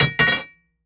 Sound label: Impact Pipe Metal Droped; Impacts